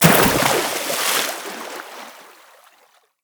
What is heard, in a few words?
water jump down; skyrim